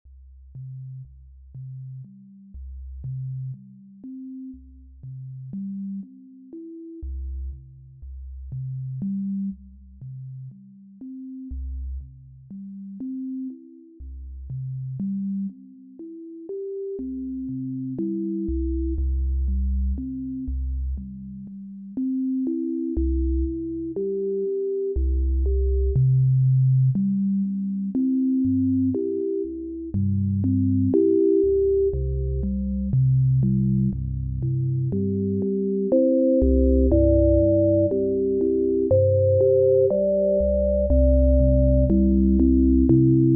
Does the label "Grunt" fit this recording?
No